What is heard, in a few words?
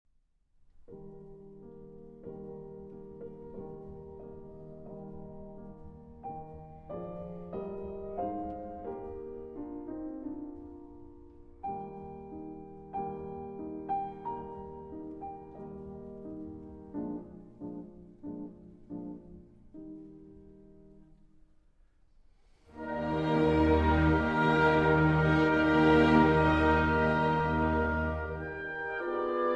Piano Concerto No. 24 in C Minor II. Larghetto